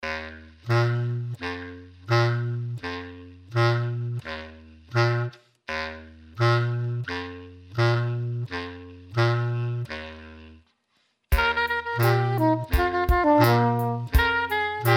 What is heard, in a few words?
drum n folk